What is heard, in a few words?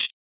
clap; goodtakimu drumkit; fl studio; drumkits